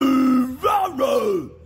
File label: rawruh